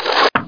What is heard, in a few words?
EGG POP